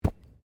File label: Body movement Organic Impact Light